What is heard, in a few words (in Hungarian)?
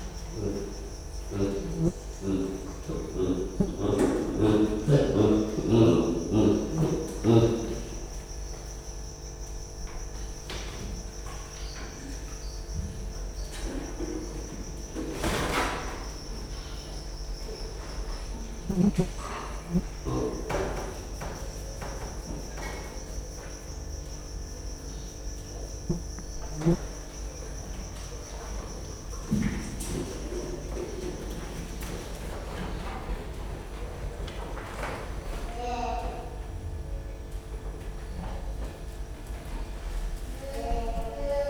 csendélet bal mikrofonnal egy rovar, gallérospávián; gyori zoo 2014